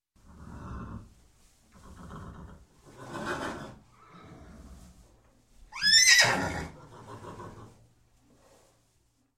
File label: Horse Neigh Grunt And Squeal; Sound Ideas; Series 2000